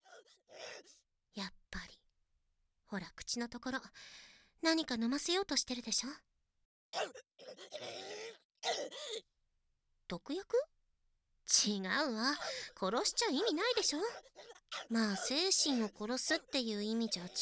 効果音入り; 犬族の獣人Hシーン

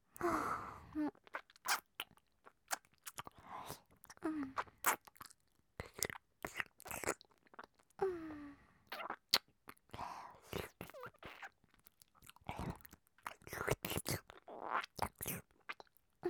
声のみ 右 おみみをちゅっちゅ, ASMR

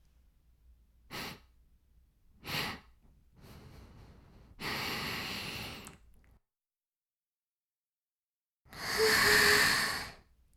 フェラ音声, ASMR; 白くて黒い魔性円環

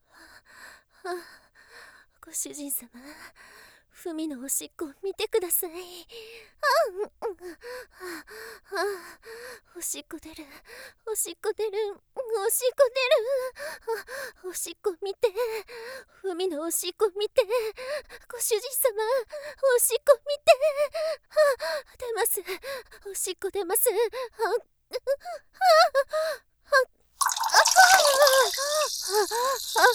3人リレーループおしっこ

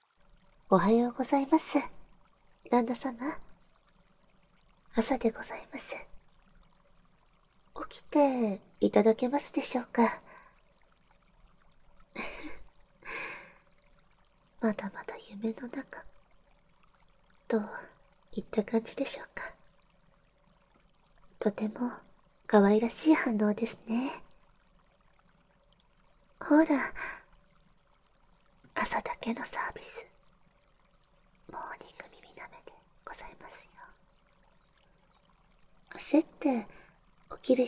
まったりモーニング耳舐め; 『愛の舐め癒し旅館』ぬらり舐め癒しバイノーラル音声 耳かき 耳舐め ハイレゾ

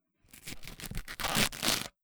びりりー

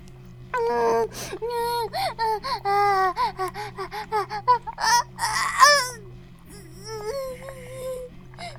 バイブ放置; イクたびにわけて

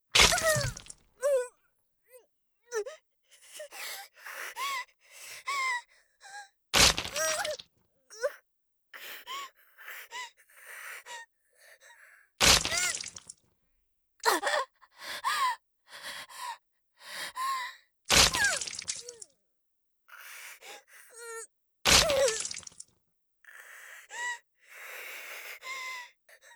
耐える; 効果音あり

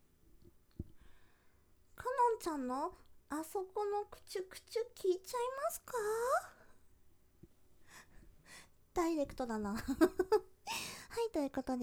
くちゅくちゅオナニー

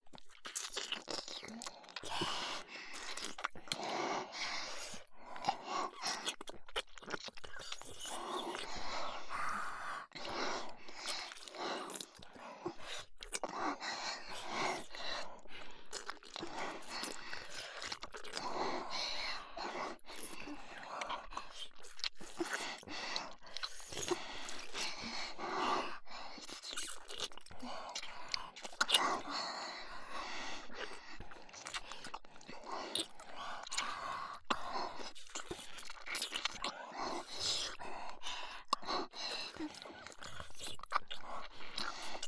キス; ルナとヒカリ

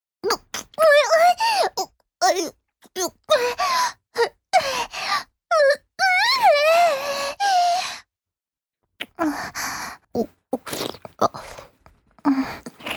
口内射精→飲精; 実用ボイス抜粋集

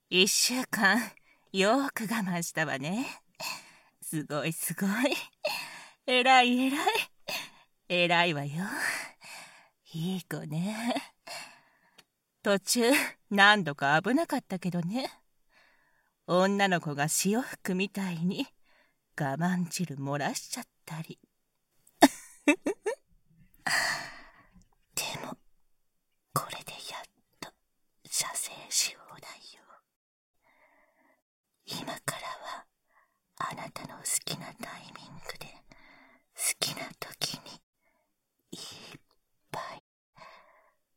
フェラチオ 連続射精 簡易モノラル; 効果音あり

